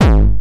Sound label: 909 dist, kicks; drum-machines; deepsky